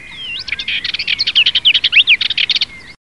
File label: Sylvia melanocephala; Fauvette mélanocéphale